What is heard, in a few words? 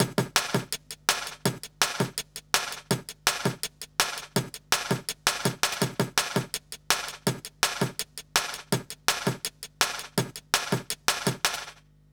165 BK, Explosive Jungle; USB Soundscan vol.05; AKAI; 90s sample CD